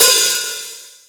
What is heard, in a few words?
Long Open High-Hat Sample F# Key, Royality free open high-hat sample tuned to the F# note